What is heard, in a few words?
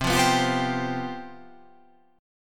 C Major 7th Suspended 4th